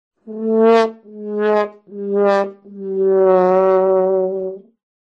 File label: trombone